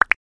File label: button tiny